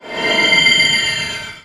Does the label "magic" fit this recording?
Yes